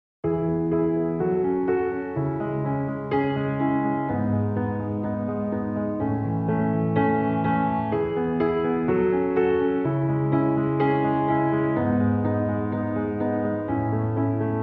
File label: acoustic